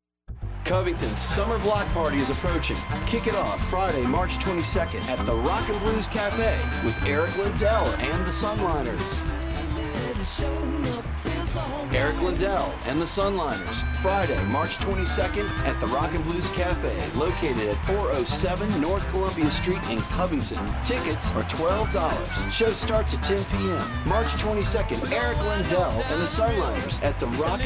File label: RnB Radio